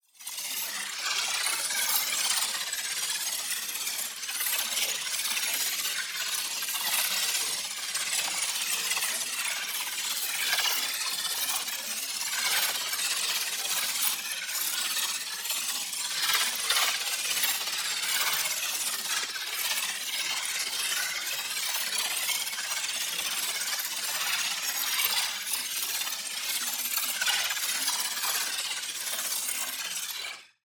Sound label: PARTICLES Celestial Field; boom library; Matter